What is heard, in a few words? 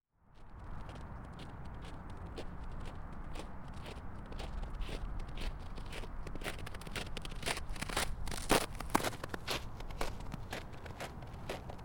Footsteps sneaker concrete snow